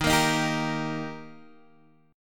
D sharp Major D sharp Guitar Half Step Down, chord